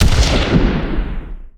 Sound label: rifle; hl2dm; oppressive territory